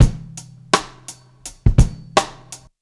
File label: 84bpm, Hip-Hop Street Beats; Hip-Hop Street Beats Construction Kit; Street Beats by Poogie Bell